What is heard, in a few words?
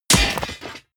clang rattle dirt; whooshes rises; hits impacts